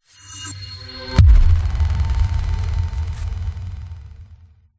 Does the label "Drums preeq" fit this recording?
No